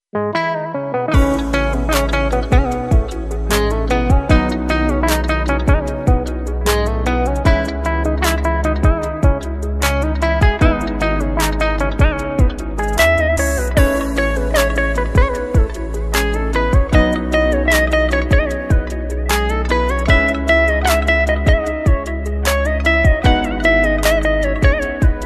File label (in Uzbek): gudok uchun sokin qushiq gitara musiqa